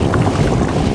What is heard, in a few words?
Poison Worker walk